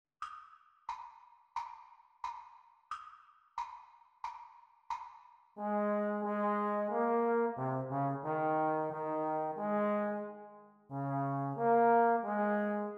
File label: Playalong for Eb Horn Exam Speed; Eb Tenor Horn duets; The Teacher part